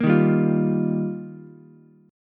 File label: D sharp Major Add 9th, Ukulele C6; strummed; D#add9; 3,3,3,8; JGuitar